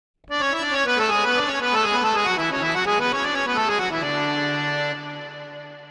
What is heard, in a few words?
FX, Harmonioum, 3-4, DM, 128; Sample Banks